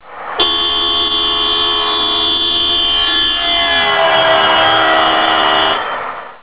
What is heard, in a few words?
30 MPH doppler; ligo